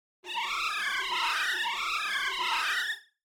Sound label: alarm